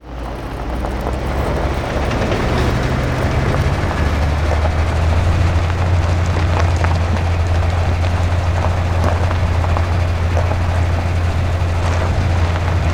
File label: apc drive loop; Counter Strike Model Pack; CS:GO